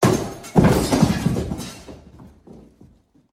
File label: Glass Crashes Impact Wooden Glass Smash